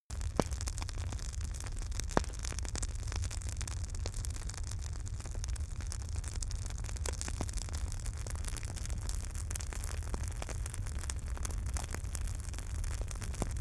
campfire small loop

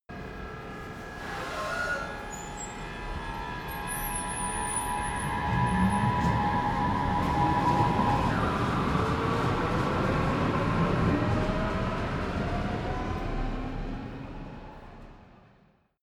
train underground station depart

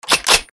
weapon clips

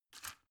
drug blister put in

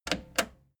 plastic button slack click slow

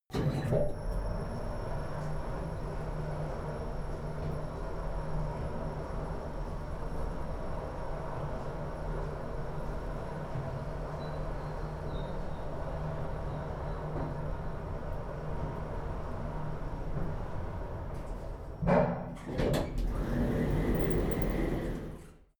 elevator ride buzz high

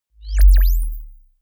bass drop sizzle soft

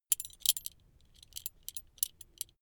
metal belt buckle clatter long soft

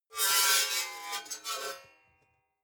metal scrape rich unsteady

